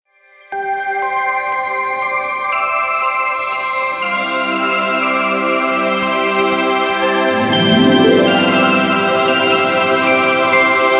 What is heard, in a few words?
Magical Christmas